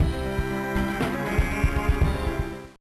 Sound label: Jingle; Pac-Man Pinball Advance; Game Boy Advance